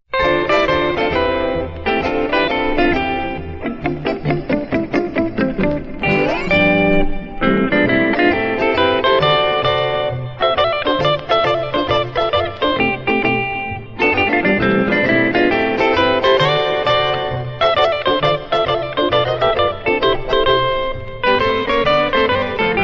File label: GUITAR THEME